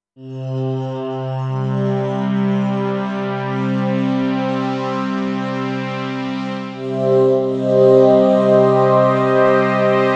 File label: Goblins Synth